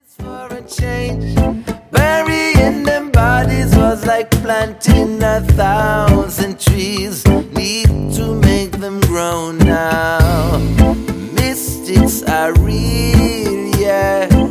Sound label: (Acoustic)